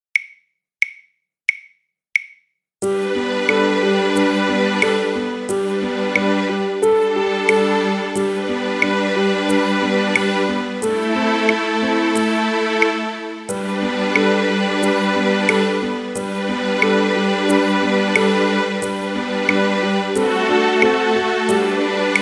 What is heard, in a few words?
Up and Down Backing Track